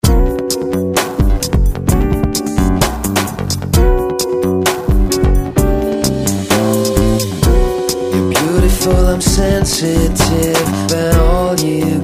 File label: didgeridoo